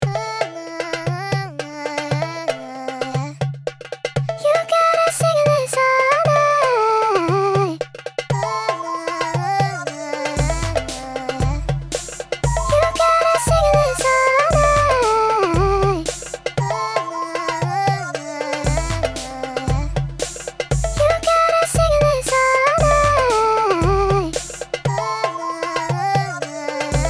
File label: Lullabye MIDI